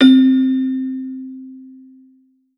Yamaha CS2x Celesta C5

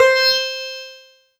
Casio CTK-611 Sitar C5

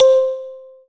Ensoniq ZR-76 Mbira C5

